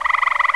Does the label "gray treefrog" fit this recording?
Yes